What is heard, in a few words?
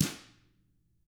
TAMA BR MF, Drums; ILIO; Double Platinum Drums 1